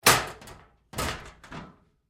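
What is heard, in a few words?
Door Locker Open close-up Med, Metal Door; Doors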